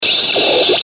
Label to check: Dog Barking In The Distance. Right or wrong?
Wrong